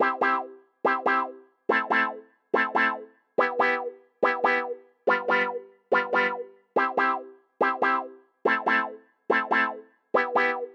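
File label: piano loop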